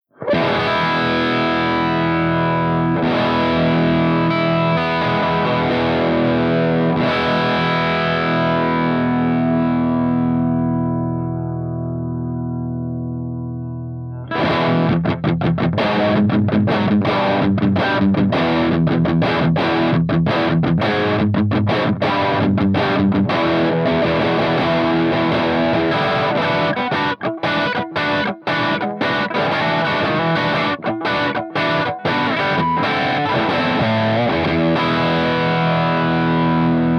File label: ROCKERVERB CH2 DRIVE V30 HB